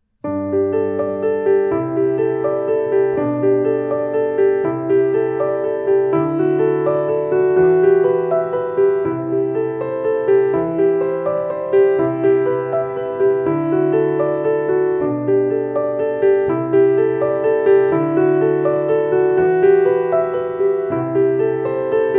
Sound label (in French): la douce brume acct, basson